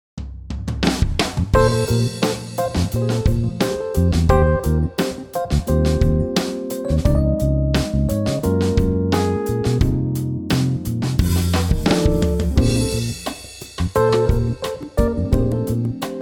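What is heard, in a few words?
Bb smooth, backing track; key - Bb - vocal range - D to G; Super funky smoothness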